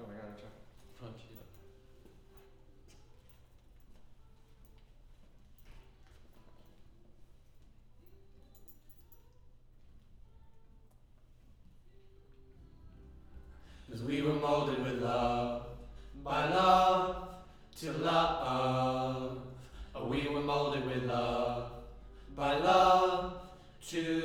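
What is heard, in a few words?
Choir Harmony Low Mid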